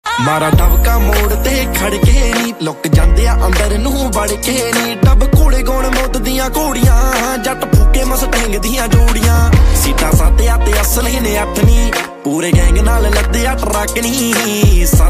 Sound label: punjabi song ringtone